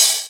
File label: Cymbal